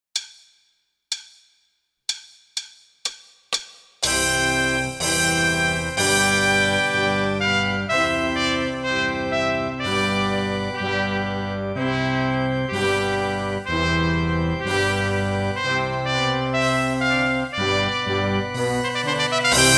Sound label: Trumpet Voluntary